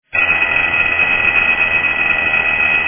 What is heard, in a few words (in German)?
Wecker; baumgartner